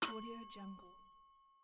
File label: call bell single; stormwaveaudio